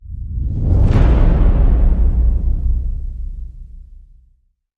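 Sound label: crescendo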